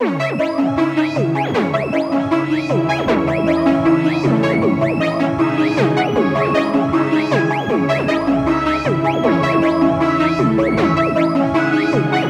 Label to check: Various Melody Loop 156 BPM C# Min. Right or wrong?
Right